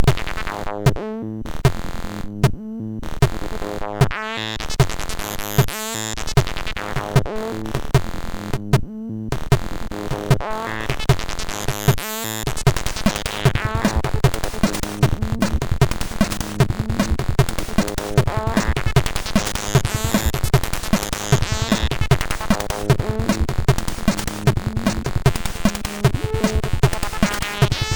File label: Stab Intro Tune, sid